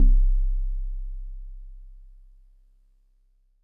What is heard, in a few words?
Warble Celeste DX100 C0